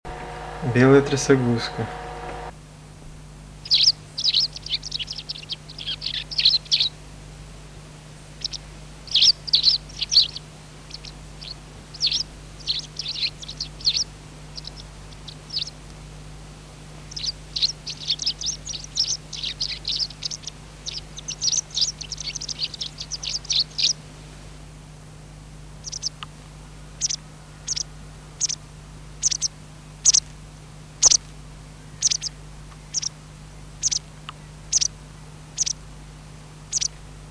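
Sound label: white wagtail voice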